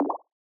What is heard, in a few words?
bubble; misskey; syuilo